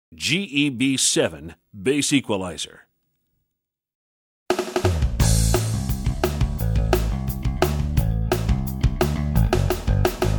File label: Bass; GEB-7